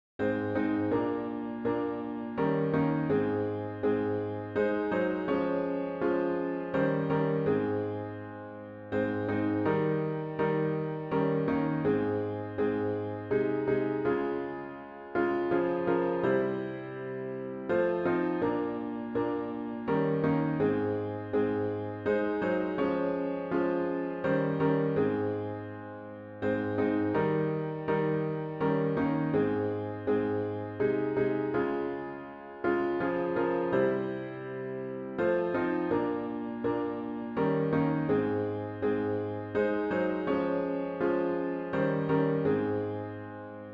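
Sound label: Hymn piano w Intro; worship service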